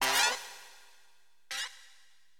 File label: STAB, AcidJazz Funk Fx; LiveAct Pool